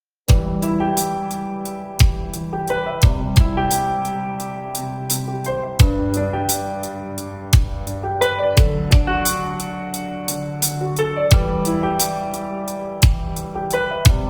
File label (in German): balladen rap; hintergrundmusik